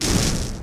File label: lighting; game